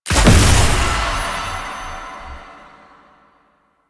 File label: Jump Pad Launch Local; Warframe; Jade Shadows; Ascension